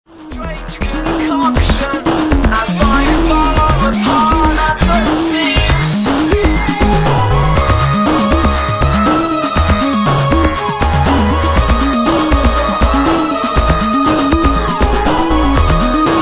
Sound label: triangle